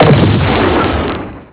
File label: explosion; twitch-1.0